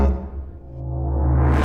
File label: Mus Tsunami 145 Light; from dust